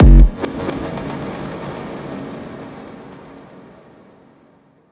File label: Fail; Vivid Stasis; Fail Hard